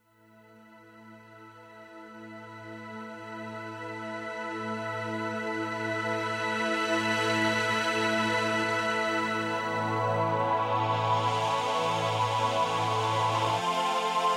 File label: Groove